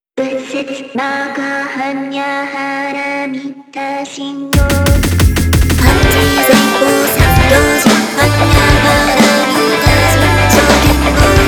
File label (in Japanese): 般若心経ポップバージョン, PopRock